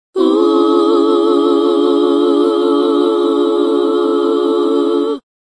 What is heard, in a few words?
style, African Vocals Sung